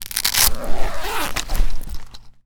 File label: Armor Full; zps